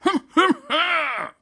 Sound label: Guard Start Run Stumble Come Back; Subway Surfers City; Android, iOS